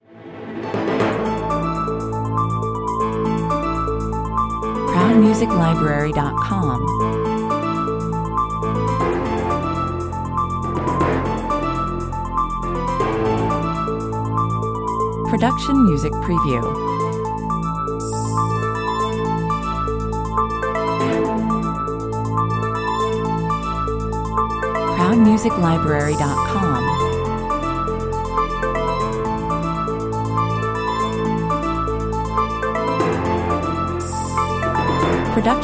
Documentary or reality-TV style background track. Very pensive, with a a feeling of urgency; Documentary or reality-TV style background track. Very pensive, with a a feeling of urgency, critical situation, danger, urgent investigations, rescue